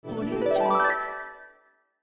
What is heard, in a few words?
dream harp